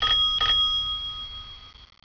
timbre bici; unitcircusweb

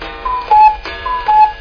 cuco; primartis; sonidos

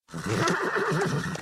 YEGUA ALTO; mekanta; jungla

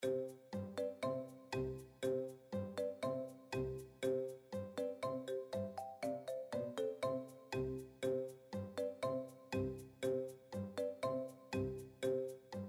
musica premio; mekanta

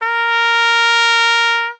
trompeta larga; primartis; sonidos

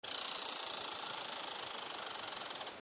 sonido ardilla; mekanta

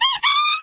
conejo; primartis; sonidos

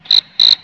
grillo; primartis; sonidos

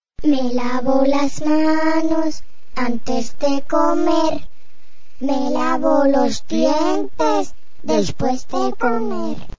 cancion lavo manos antes comer; fantasmin

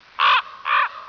cuervo; primartis; sonidos

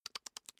ardilla; mekanta; jungla

